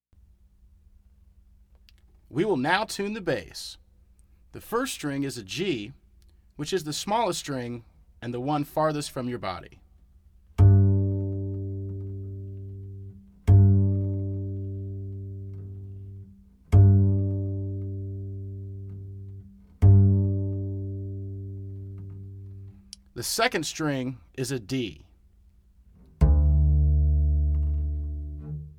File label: Tuning